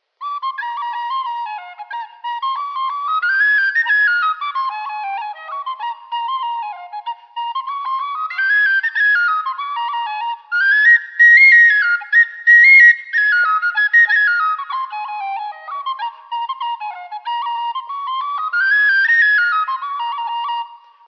Eb Blackbird The Humours Of Tuaimgreine Hornpipe, whistles; Freeman